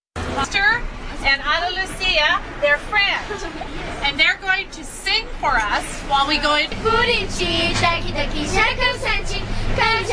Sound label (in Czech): Zpivajici indianky, Ekvador; Video Ekvador